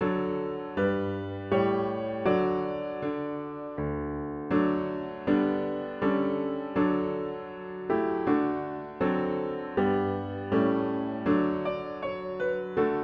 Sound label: piano; ROBIN ADAIR